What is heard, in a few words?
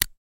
Zippo Lighter Close; lighters & matches